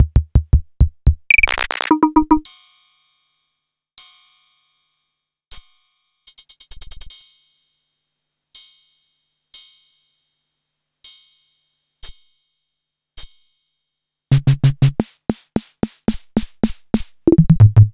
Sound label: Vermona DRM2